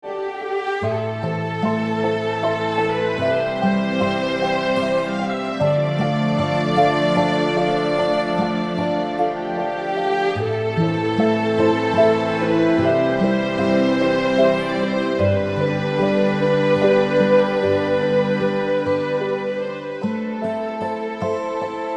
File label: Country Lanes